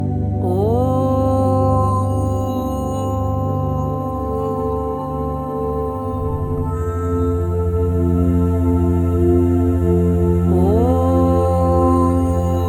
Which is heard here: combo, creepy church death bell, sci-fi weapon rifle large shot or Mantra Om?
Mantra Om